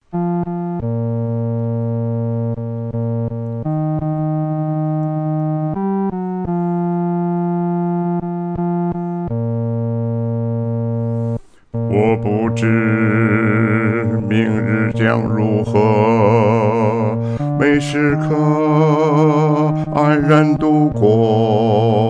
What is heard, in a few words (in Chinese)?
独唱 第四声; 男低